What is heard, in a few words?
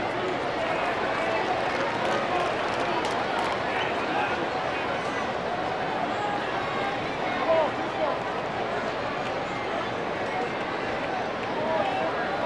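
baseball crowd ambience loop; SFX Kit; Crowds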